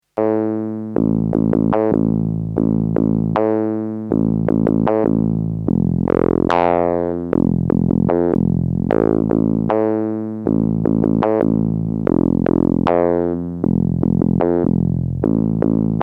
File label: SY 1 Basses raw and dry